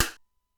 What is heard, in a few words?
Simmons SDS-9 drum machine; Factory C4